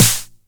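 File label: 909 SD Tape, snare drum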